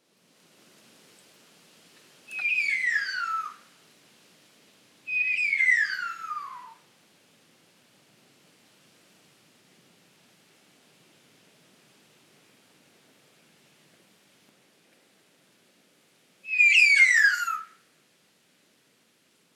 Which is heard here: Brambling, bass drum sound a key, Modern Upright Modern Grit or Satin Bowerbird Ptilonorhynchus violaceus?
Satin Bowerbird Ptilonorhynchus violaceus